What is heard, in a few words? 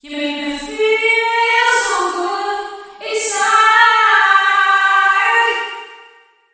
Amphitheater Reverb Female Vocals Wet; Echo Chamber; Echo Chamber is a stereo reverb and delay plug-in; Syntheway